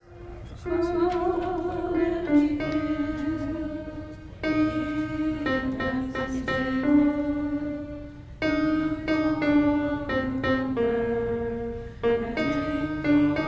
altos

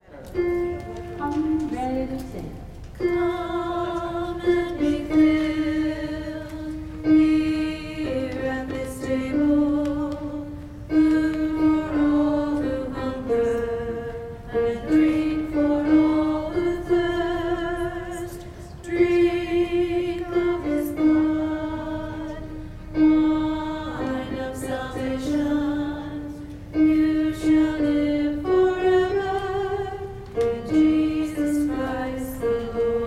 alto

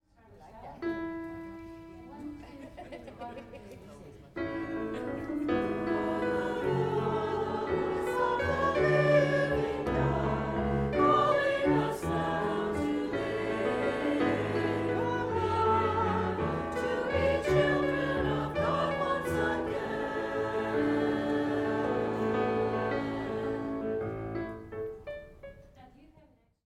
refrain all; Gather Hymnal

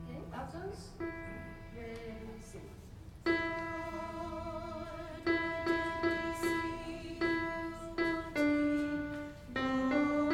alto

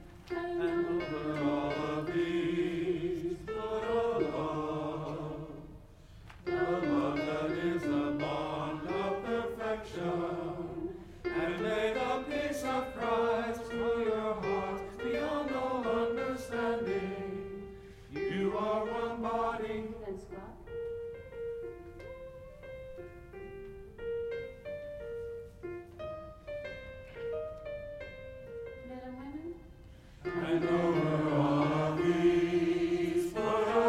One In Love choir part

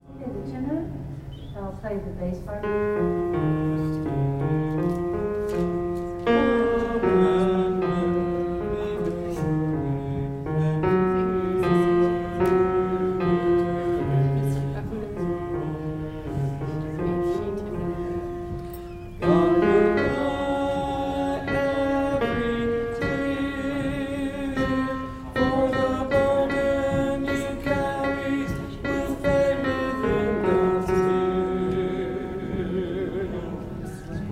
tenor; Gather Hymnal

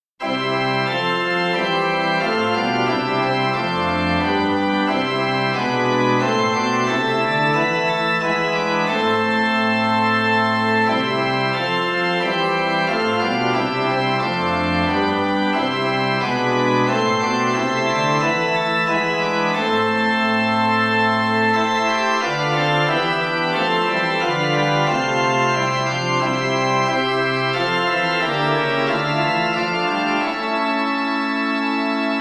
organ; Gather Hymnal

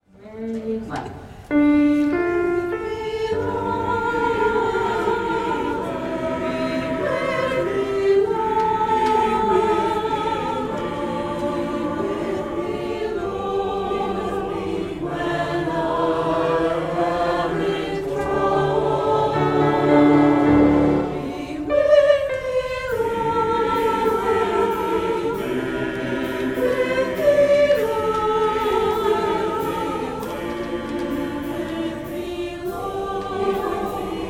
all a cappella

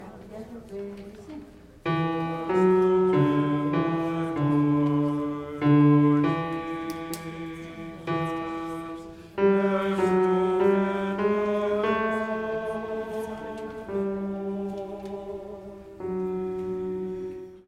bass; Advent Prayer

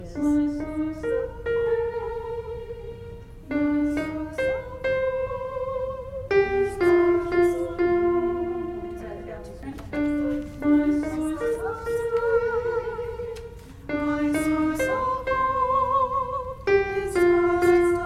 altos

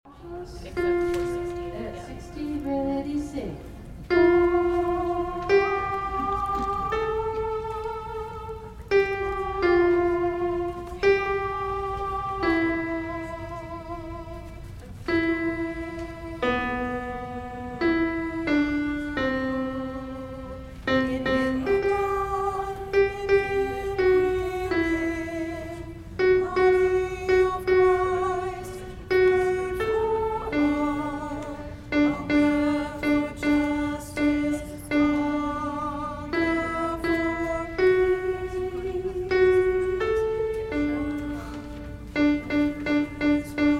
altos

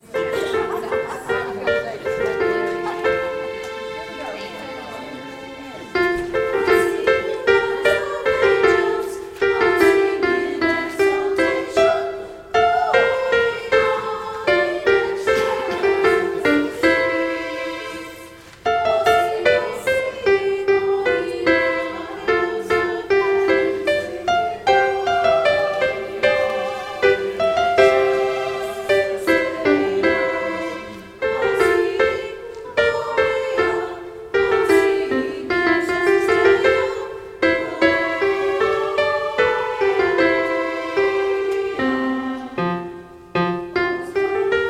soprano

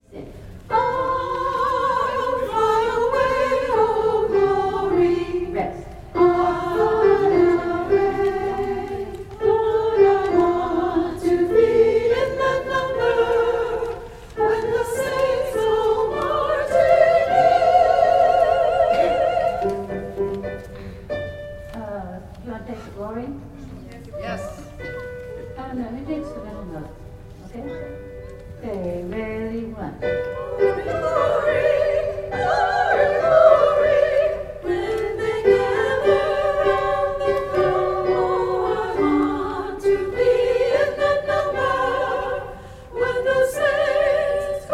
soprano; When the Saints Go Marching In

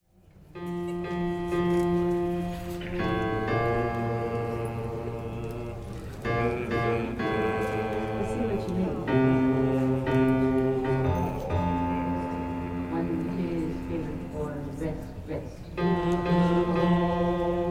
bass; Gather Hymnal

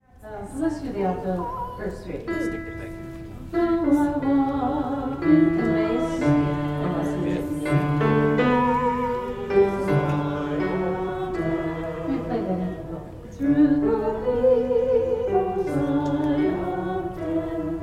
alto and bass on verse 3; Gather Hymnal

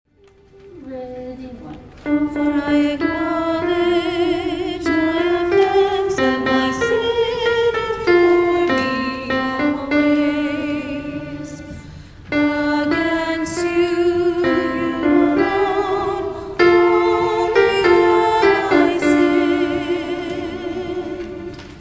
ladies; verse 2

